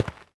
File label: dirt step; Golden Crayon Sounds